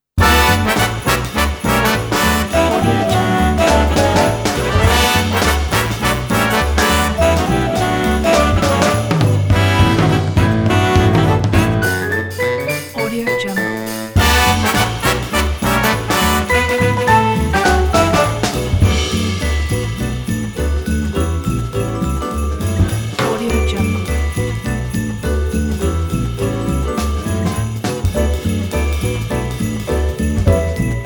christmas fun

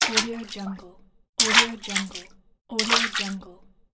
the water splash

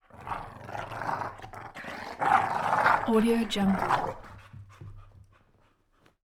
dog snarling

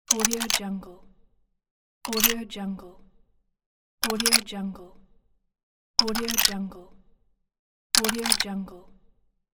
sniper rifle load bullet; prowaveaudio

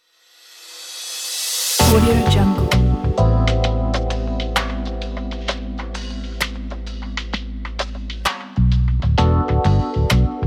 coffee shop

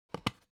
object plastic dvd case remove dvd

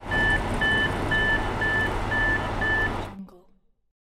machine peeping

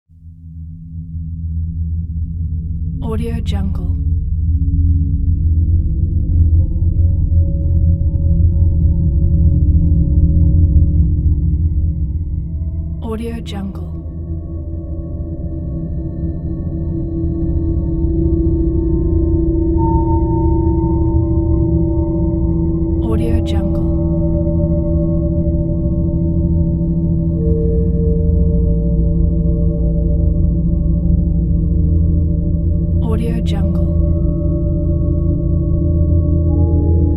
atmo pads undercurrent; smartsoundfx

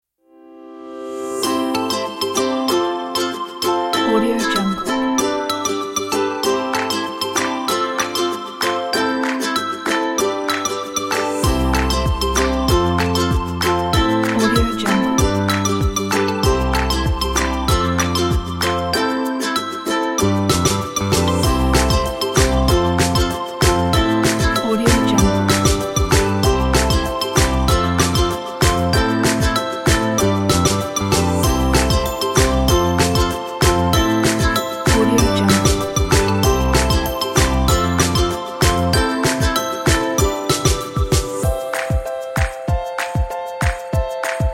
for happy kids

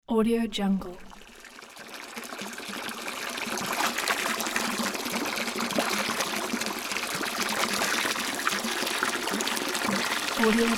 water flow

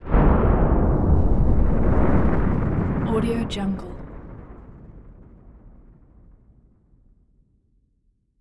blasts various; matter mayhem; soundmorph

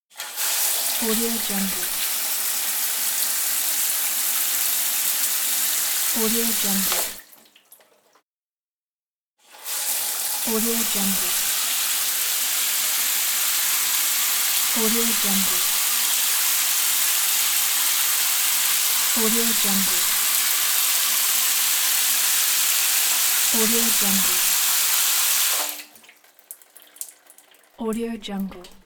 shower

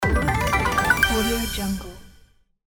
quest complete

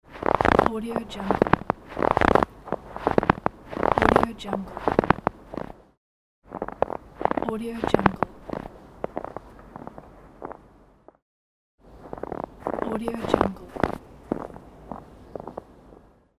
snow footsteps

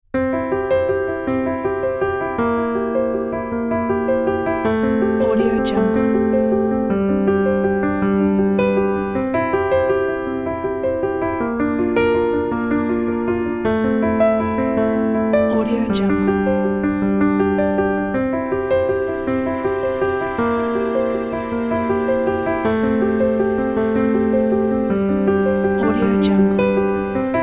creative piano

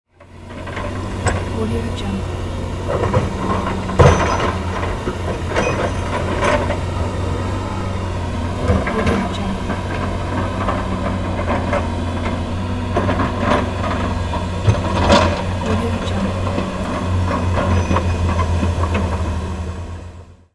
excavator

